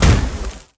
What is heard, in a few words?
impact fire; smite; god merlin